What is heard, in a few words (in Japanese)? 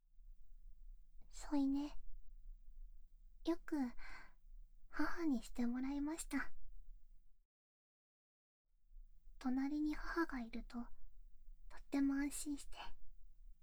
添い寝×寝息ボイス; ずっと、傍にいてあげる。